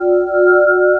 Game, 游戏音效; 特酷声音素材库

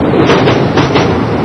Train; 暴力赛车

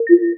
Button, 按钮; 特酷声音素材库

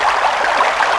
Game, 游戏音效; 特酷声音素材库

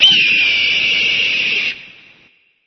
condor; 暴力赛车

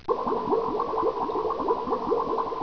Water, 自然, 水声; 特酷声音素材库

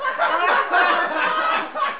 people Laugh; flash MX 2004 Game Programming

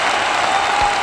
Game, 游戏音效; 特酷声音素材库

